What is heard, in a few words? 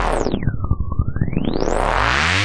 bass rolly down up; Basses